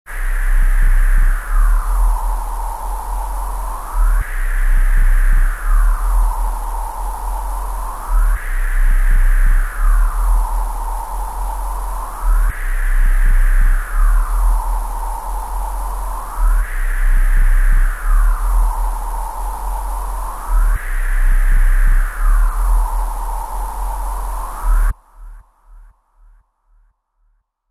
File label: UFO Ambiance, Futuristic sounds inside an alien spacecraft; Spacecrafts